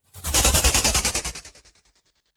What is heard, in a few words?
Strobe Transition